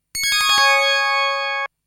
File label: Coin; Galaxy Force